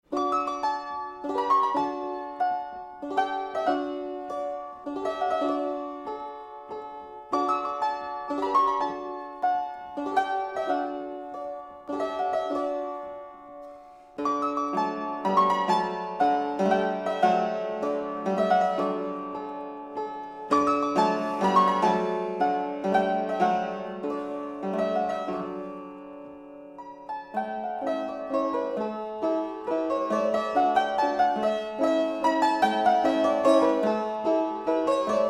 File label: waltz; mr Cobbe music manuscript 1795